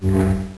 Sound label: Swing Light Sword